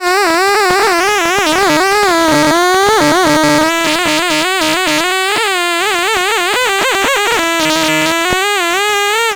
Apple II FX Drunk Mosquito, Chiptune

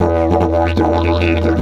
E 150, DIDGE RIFFS

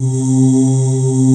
PAN CHOIR; STRING+CHOIR; Pro-Rec; Synthsonic

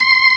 ORG, Organs

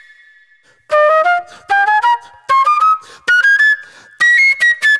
NY 70's Jazz Flute

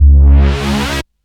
FX, Drum & Bass

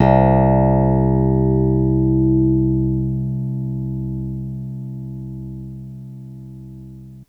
Piano Clav c1 med; TrashLibrary

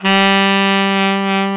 TENOR SAX; Alpine Volksmusik

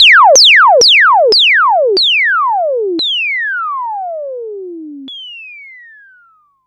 decelerate fall; One Shot FX; Sample Magic; Transmission-X

